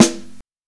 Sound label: Snare Warm, Drum and Bass; EZ Rollers; Instrument Samples